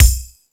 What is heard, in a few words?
Thundadome, E-MU Pro-Cussion